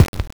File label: Crushed Perc, bitcrushed; musicradar